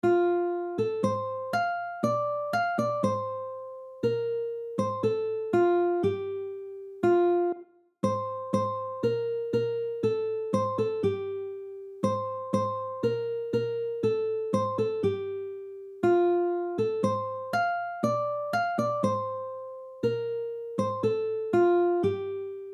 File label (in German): alle voegel sind schon da, ukulele; ukulele kinderlieder mit 2 akkorden lernen